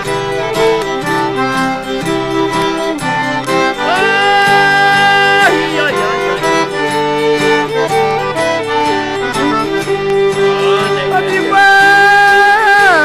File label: Chicago Blues